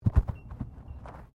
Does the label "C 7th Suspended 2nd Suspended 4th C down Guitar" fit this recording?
No